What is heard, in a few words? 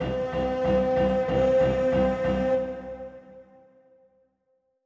Strings Outtro Victory; Goblins Stems; song of iron